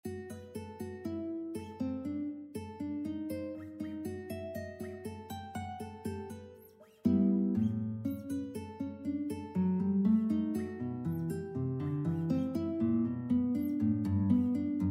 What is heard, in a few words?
prelude, guitar